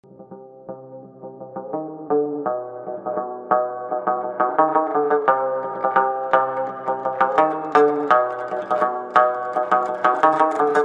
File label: bass intro; games; ringtones